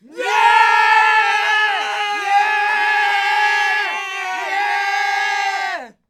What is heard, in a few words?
Group Scream